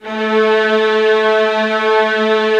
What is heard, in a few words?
VIOLINS, Synclavier Strings; ILIO